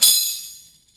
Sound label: Tambour fx, drum machines